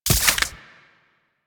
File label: Arch Gun Primary Beam Impact Flesh; Warframe; Archwing; Hildryn